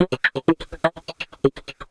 voc 125, vocal-fx, vocal house; PS-28 Vocal House Samples

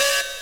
303 Acid C#; Vengeance Samples

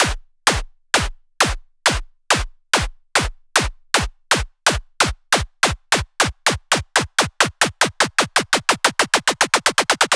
128BPM Accerlator Snare; FX Fills; 8Bars; Vengeance Samples

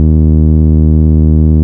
Root D#, Bass; Vengeance Samples; Vengeance Ultimate Bass

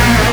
Synths G#; Vengeance Samples

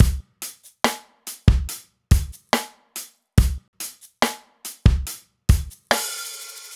Slomo Beat 140 BPM, Loops, Slow Motion Beats

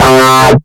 Synth Bass; Vengeance Samples; VEE Synth Shots

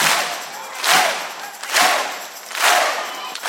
Crowds; FX; Vengeance Samples

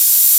Cymbals HH Open; Vengeance Samples

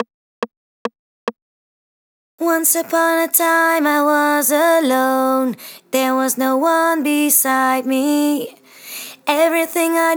Vocals, 141 BPM; Vengeance Samples; Once Upon A Time

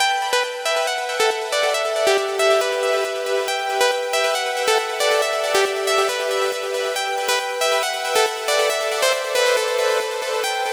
Pluck WET 138BPM, Eminor; Bassline & Melody; Vengeance Samples; Freefall Kit

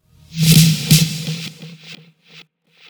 Fill Ins 128BPM; Vengeance Samples; VEH2 Loops

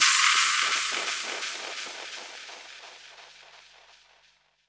Crash, Cymbals; Vengeance Samples; VEH2

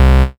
Bass C, Offbeat Bass

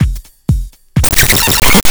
drum loop 125, puristic, vocal house; PS-28 Vocal House Samples; drumloops

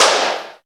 Claps; Vengeance Samples

